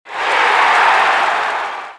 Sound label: away team scoring